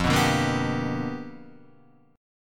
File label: G 7th Sharp 9th F chord